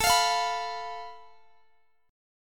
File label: A Suspended 2nd Sharp 5th, Guitar, DADGAD; Asus2#5; strummed; x,x,19,22,20,21